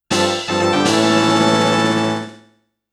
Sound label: Item Acquired